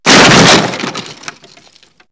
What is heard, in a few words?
Demolition; X-Static Goldmine